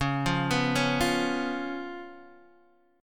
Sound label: Db 7th Db down Guitar Half Step Down